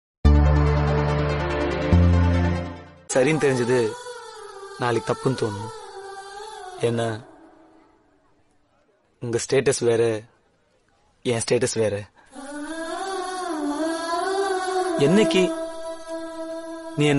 tamil

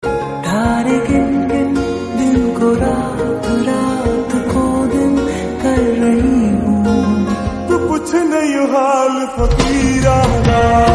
slow and reverb hindi song

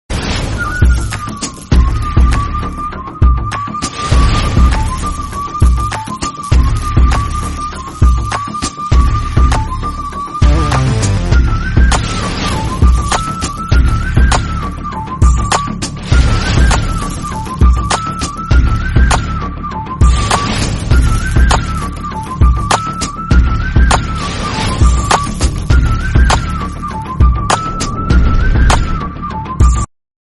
flute bgm, tamil; valimai

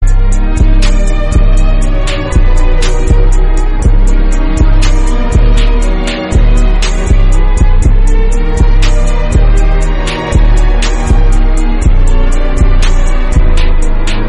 instrumental music

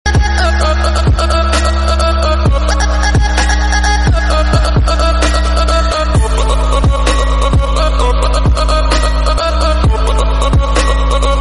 drum instrumental

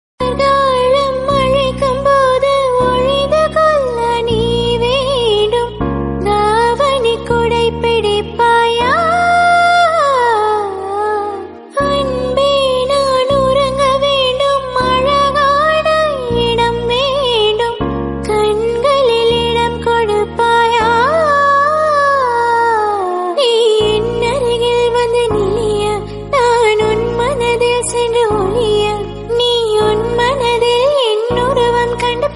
song tamil

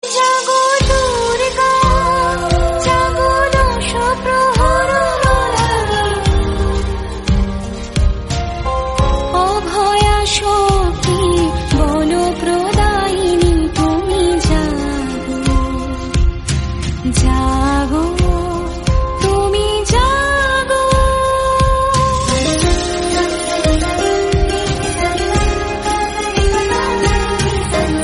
mahalya bengali devotional song

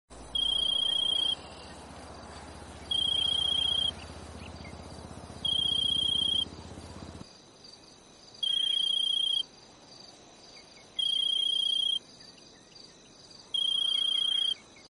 online call sound effect; gta

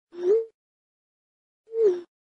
sound effect message tone; star wars; r2d2

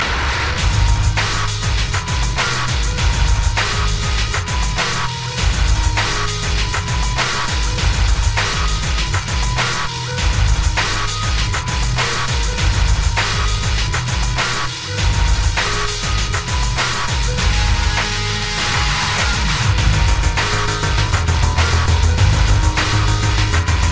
techno; dbz; cell

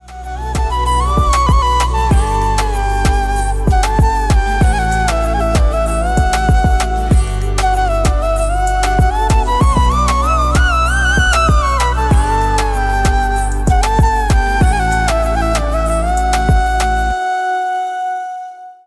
Bansuri

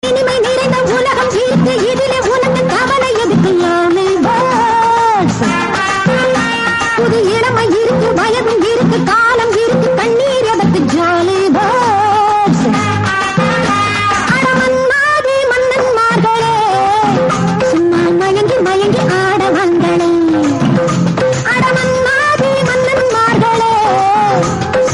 tamil song

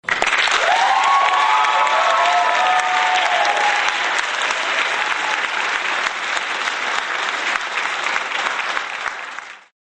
clapping sound

